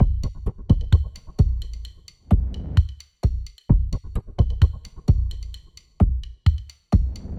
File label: Mystery Loop